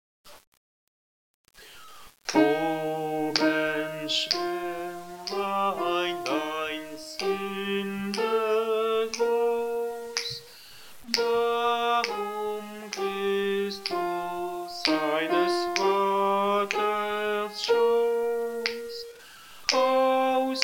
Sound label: o mensch Soprano